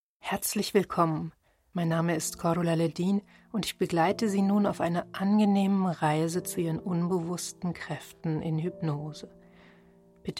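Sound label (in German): sleep with meditation; Wundervoll schlafen mit Meditation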